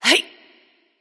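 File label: grunt attack, female; smite; voicepack; hera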